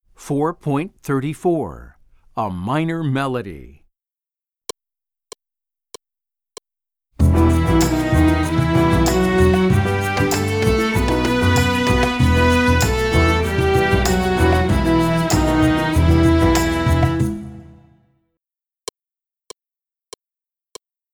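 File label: A Minor Melody, String Orchestra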